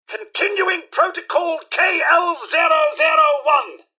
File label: attack; nukarobots; mrhandy